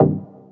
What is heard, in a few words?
Synth Short Hit